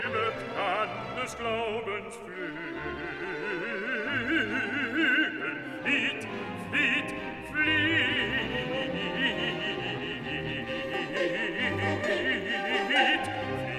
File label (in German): SOPRAN, Stimmen mit Klavier; Johannes Passion